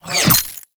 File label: Pistol Projectile Pass By; Temple Signature; Warframe; Tenno